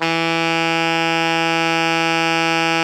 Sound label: ALTO FF E 2, saxophones; Greytsounds; Philip Wolfe David Crozier's Saxophones